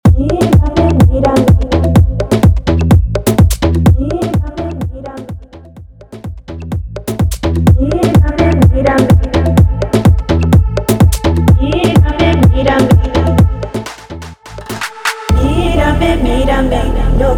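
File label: tech house remix extended 126 bpm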